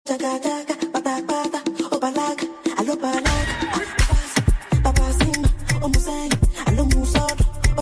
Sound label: Remix Sped Up